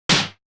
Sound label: lightsword clash; soundscrate